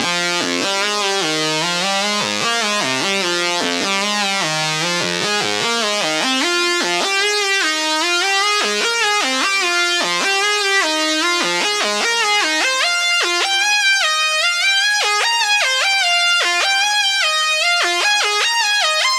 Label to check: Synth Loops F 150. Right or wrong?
Right